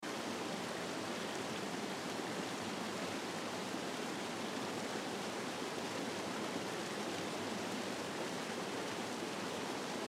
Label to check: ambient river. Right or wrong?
Right